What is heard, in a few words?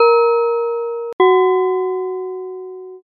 pinpon bell